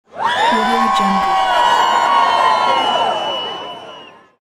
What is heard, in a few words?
people screaming concert; human sounds; جمعیت در حال جیغ و فریا